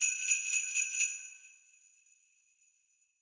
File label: jingle small